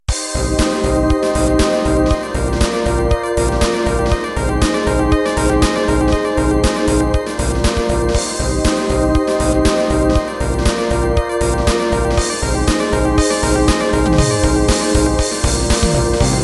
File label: Dream end